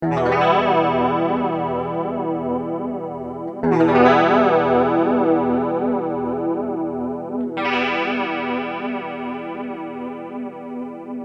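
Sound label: clean electric guitar jet flanger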